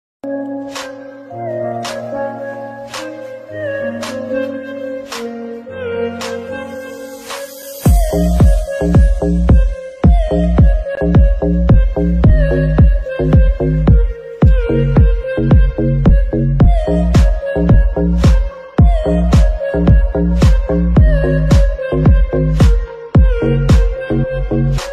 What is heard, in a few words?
attitude ringtone bgm ringtones english ringtone